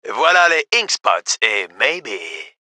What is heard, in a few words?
music